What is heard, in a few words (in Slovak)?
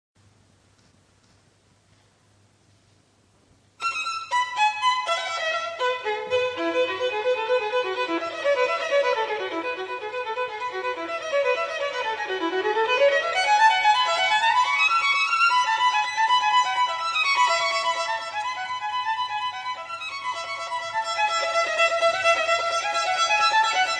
husle